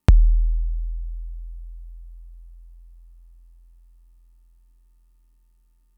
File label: kick, Fricke MFB-522